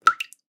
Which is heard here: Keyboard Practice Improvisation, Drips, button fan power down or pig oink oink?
Drips